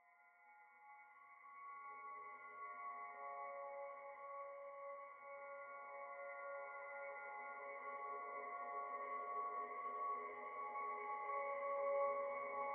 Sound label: vespers for violin